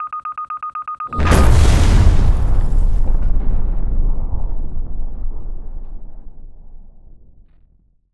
beep mine explosion; Bluezone Corporation; War Zone (Designed Explosions)

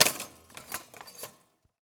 toaster; 99Sounds; Sound Design Tools; Raw Foley

